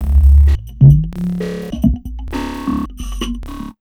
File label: JMP 130 FX, Big Beat Drumloops; JUMP 130